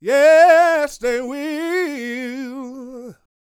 Vocal Gospel; Vocal Planet Vol1 Gospel

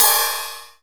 RIDE, MONO RIDE CYMBAL

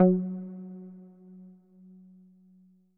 F#2, SYNTH WAVES; Music Store Sound Service; Einstein

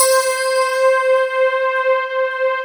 SHIM PAD C4; SLIM PAD; Synth

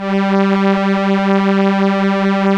STR ELKA Cellos, Elka Strings

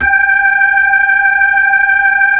B-3, Brite; Keyboards of The 60's and 70's

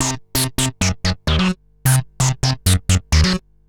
Analoop, Industrial Analog

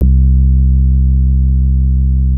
Anasound Long C, Industrial Analog Single Notes

